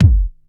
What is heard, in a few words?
low kick drum sample g key